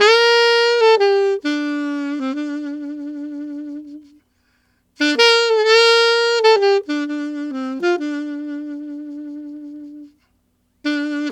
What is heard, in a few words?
Alto Country Waltz in G min, Sax; Sonic Foundry (Sony Creative Software)